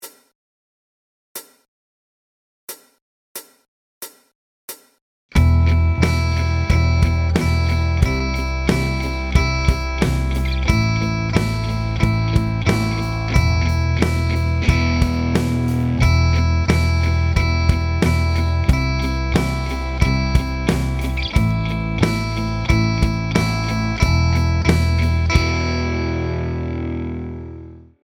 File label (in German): E Gitarre